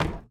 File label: Footstep Plastic Tech